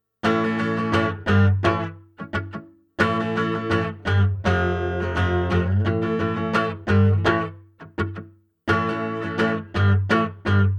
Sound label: Strat Bridge PU Acoustic Mix; PerfectSpace